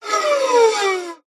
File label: cartoon descend violin raw badly played